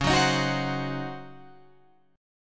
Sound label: C sharp Major 7th Suspended 2nd Suspended 4th Guitar Open F; C#M7sus2sus4; strummed